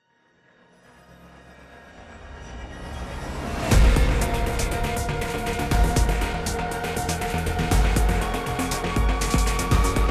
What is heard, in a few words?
Character Select; SoloMode